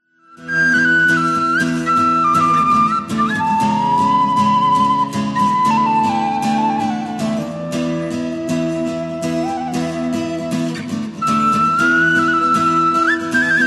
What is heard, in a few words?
instrumental